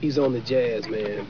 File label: jazz